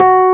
EPF F#3; AKAI S-Series CD-ROM Sound Library VOL-2

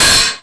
OHH, Roland JX-305

